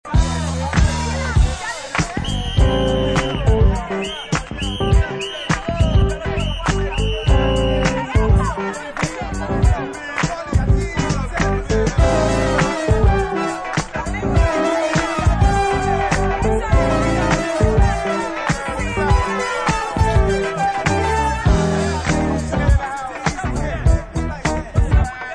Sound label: Instrumental